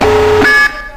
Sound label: alarm